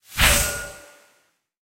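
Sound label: debriefing medal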